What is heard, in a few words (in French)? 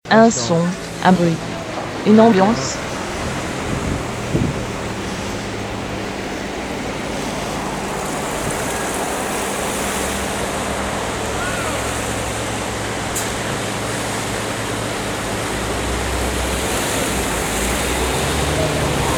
Un son un bruit une ambiance